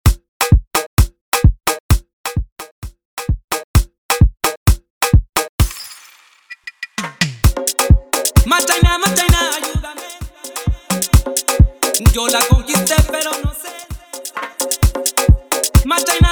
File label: afro bootleg 130 bpm